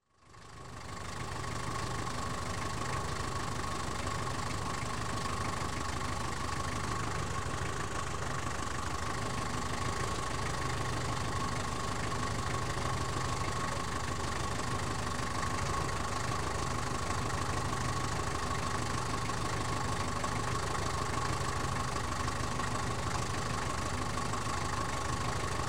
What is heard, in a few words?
Taxi - Exterior - ticks over; Cities; The BBC Sound Effects Library